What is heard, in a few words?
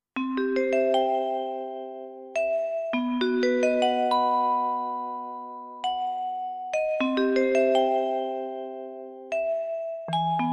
Vibes; XG